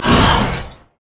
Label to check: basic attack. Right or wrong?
Right